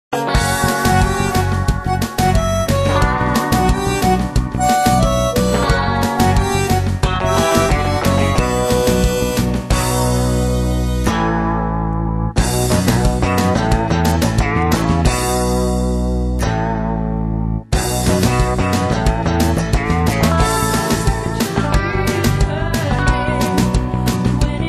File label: tango